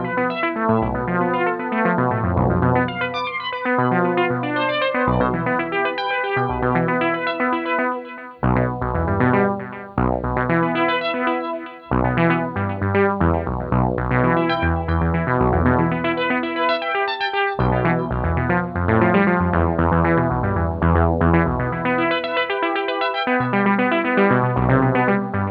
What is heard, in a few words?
Jazz